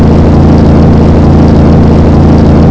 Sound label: engine